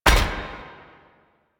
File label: Melee Step; Warframe; Necramech